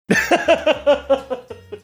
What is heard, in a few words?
chuckling